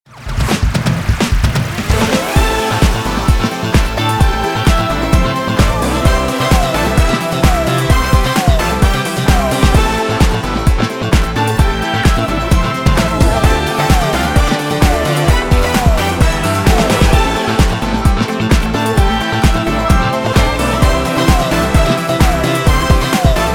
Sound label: Nu Disco